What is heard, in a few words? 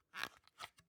object plastic hook fold out